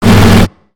Robot Air Flail; creatures mechanical; Lego

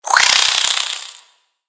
Spider Rage; creatures mechanical; Lego

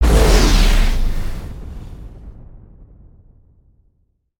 Tornado Endblast

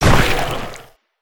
Man Eater Shoot; weapons; aronwk collection; ndaudio

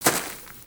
Footstep; Lego; Strombie; creatures organic

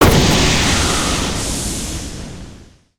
Full Steam Ahead On Hit

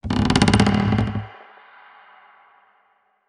bridge creak; gnarled forest

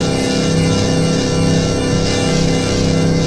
laser cut

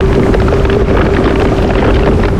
Spinjitsu Earth Tornado Loop

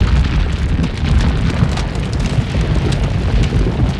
smolder stack, ambience avant gardens

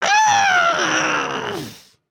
Angry, emotes

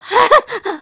fear

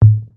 generic THUD; aronwk collection; ndaudio

